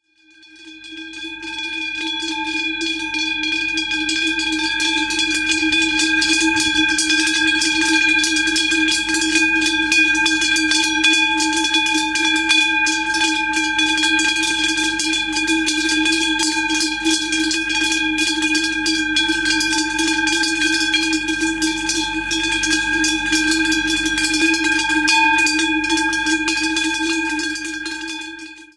kuhglocken klingelton, kuh muhen sound

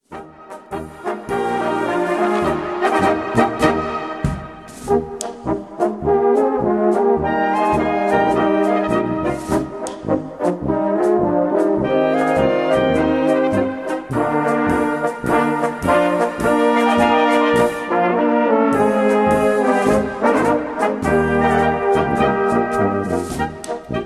klingelton blasmusik polka

